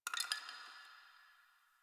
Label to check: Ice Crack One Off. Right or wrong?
Right